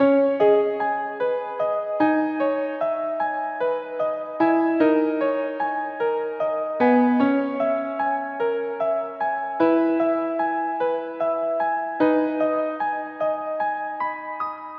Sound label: rainy lounge